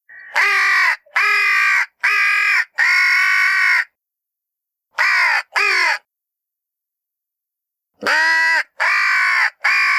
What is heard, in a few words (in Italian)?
Cornacchie